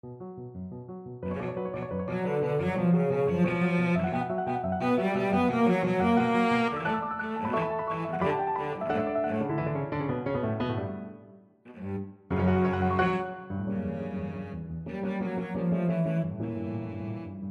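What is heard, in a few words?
violoncello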